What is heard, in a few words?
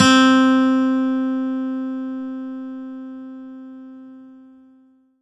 Korg TR Rack Finger Pickin C4